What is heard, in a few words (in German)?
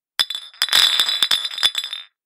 Anstossen